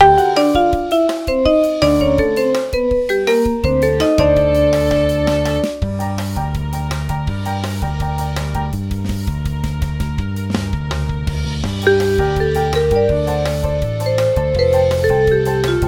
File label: Latin Party